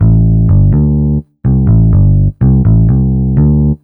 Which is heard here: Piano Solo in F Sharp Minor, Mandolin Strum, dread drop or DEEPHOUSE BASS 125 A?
DEEPHOUSE BASS 125 A